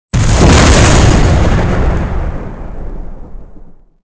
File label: explosion punch; weapons; Soldier Of Fortune II Double Helix; XBOX; explosions